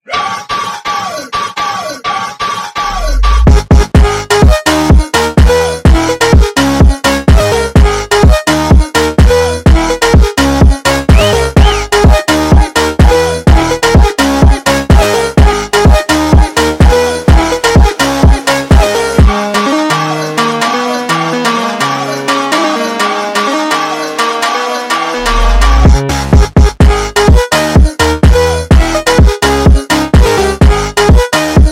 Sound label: Raaah Funk sped Up